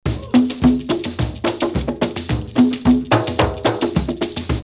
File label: Porto Novo 108, drumloops World&Ethnic; X-Static Goldmine